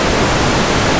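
0 600 noise+300, a 300Hz sine signal with no IIDs or IPDs is presented along with a 600Hz-wide noise band, centered at 300Hz; must use headphones; Fundamentals of Sound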